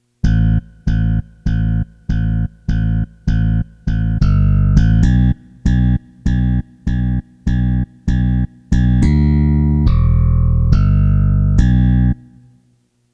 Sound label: bass